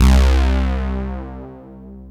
DANCE SYNT, techno trance; E-MU Formula 4000 Series Vol. 2

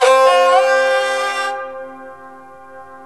SARANGI, Indian Ensemble

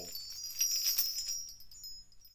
BELLTREE; ILIO; Synclavier Percussion 2; World & Orchestral

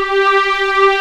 G4 LEG, String Collection; East West; The Ultimate String Collection

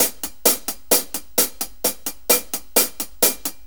4-4 HiHat Loop; Drum Tools

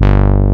ANA BRASS; Pro-Rec - Synthsonic; JV ANALOG1

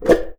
CAN, household; AKAI Anime Sound FX

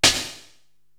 SD Ambi Noise, Korg TR-Rack drum machine

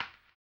HAMBONE; ILIO; Vocal Planet VOL-2; Blues & Soul

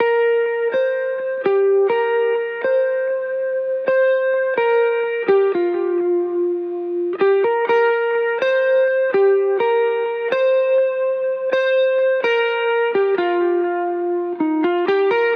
Guitar Bpm125 Key C min, 70s Guitars; Fox Samples - Back to the 70s Guitars

